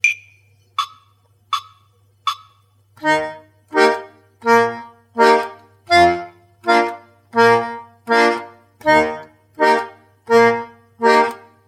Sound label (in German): grundbass akkordbass wechselbass